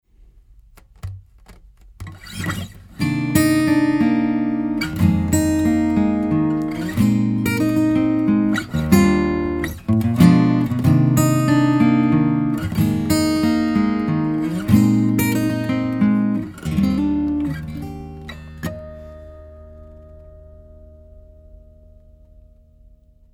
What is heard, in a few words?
acoustic guitar, omni; lct 441 flex; lewitt